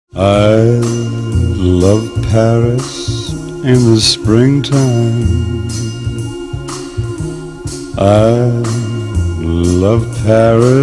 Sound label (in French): Chorale